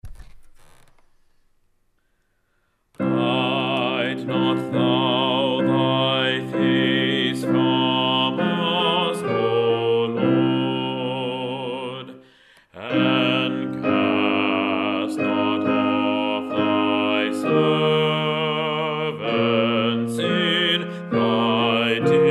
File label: Bass; Hide Not Thou Thy Face from Us, D. Farrant; Lent